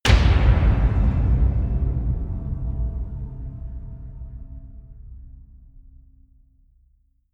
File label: impact hit; whooshes rises